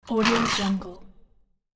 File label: chainsaw no start rev